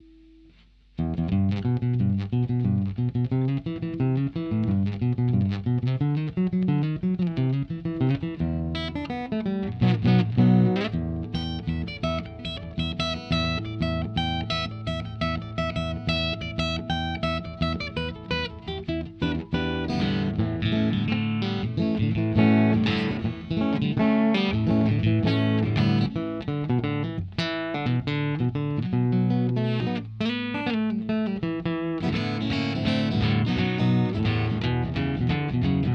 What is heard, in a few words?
Without Acoustic Effect